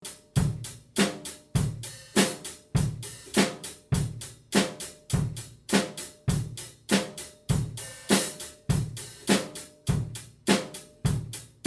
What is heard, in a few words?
hi hat pedal practice